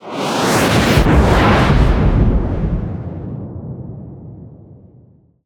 combat drone jet texture passby; spaceship; Bluezone Corporation

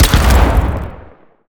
soundwave weapon scifi explosion debris; Bluezone Corporation

